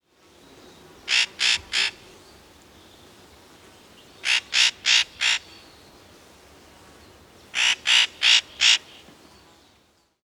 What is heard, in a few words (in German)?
Tannenhaeher; Sommer; Der Tannenhäher wird auch als «Arvengärtner» bezeichnet.